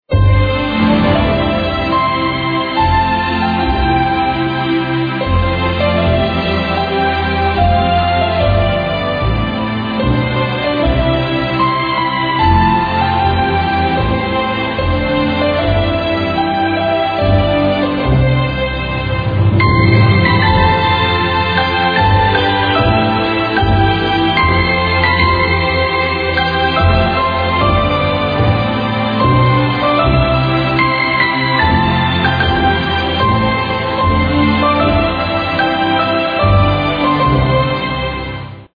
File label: Melody; Originalmusik zu den RTL-Formel 1 / Übertragungen 1995/96; Paros Music; Love Song Melody; Tchibo; Intercord